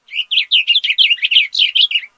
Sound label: bird blue; minecraft; technic